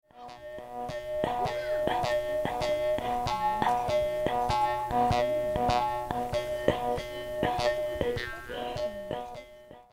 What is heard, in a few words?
instr